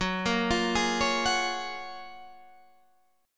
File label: Gb Major 9th, down, Guitar, Drop D